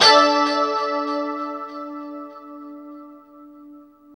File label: 2CHD D 5 -R, Guitars; Spectrasonics; Hans Zimmer Guitars VOL-1; JC-SHEEN